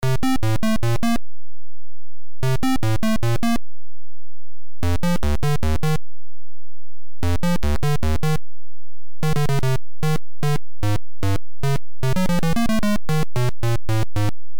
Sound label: Underground; SMBS